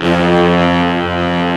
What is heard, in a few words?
BRASS MIX; Best Service Advanced Media Trax Modern Composer